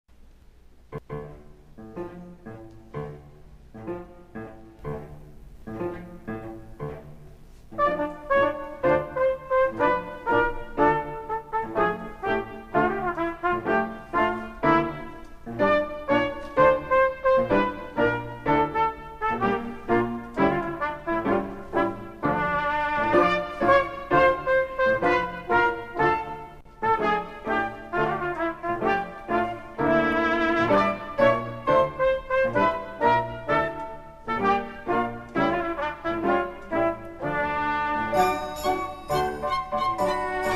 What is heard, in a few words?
Tango; Bizet